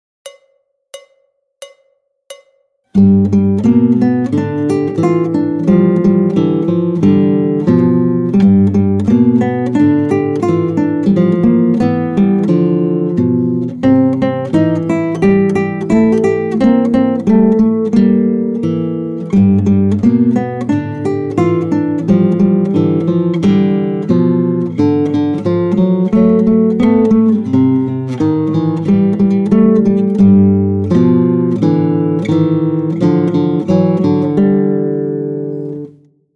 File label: Boogie Blues, Gitarre